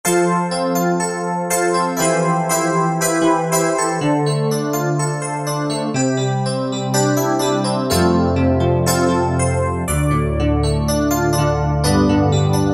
FM EL PIANO, Old School Keyboards; Universal Sound Bank; Soundscan 09 Old School Keyboards

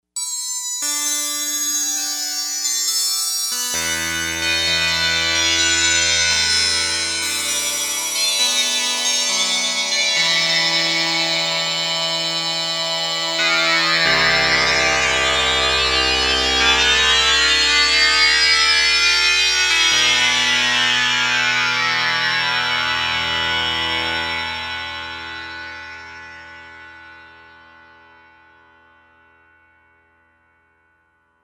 Feed Me, Novation UltraNova; Factory Patches

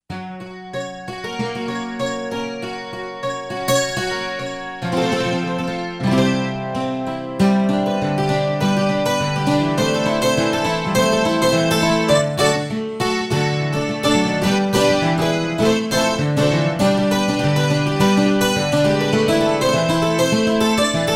Steel Ensemble; Roland SC-880; Factory Patches

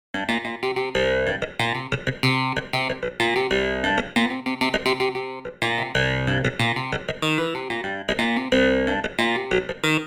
Clav, Roland JV-880; Factory Patches

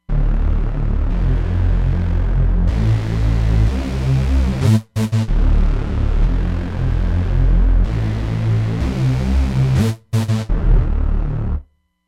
Nu RnB Saw, Roland Fantom XR

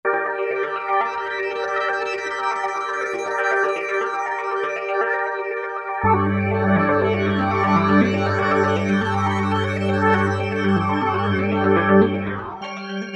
Roland V-Synth; Factory Patches

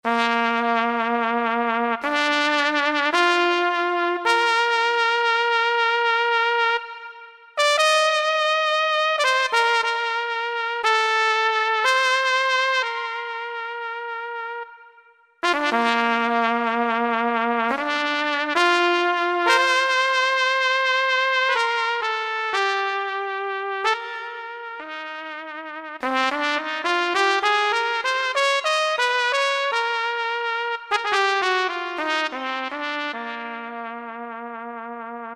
Trumpet, Yamaha PSR-3000; Factory Voices

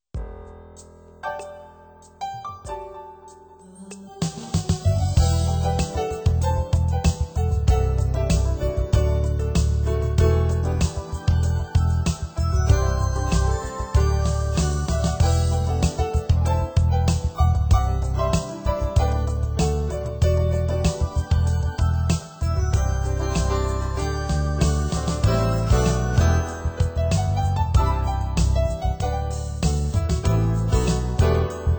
Yamaha PSR-530

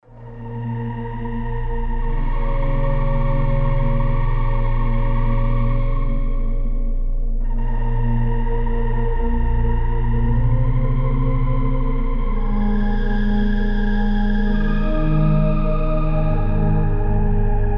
Meditation, Korg MSC-16S; Environment

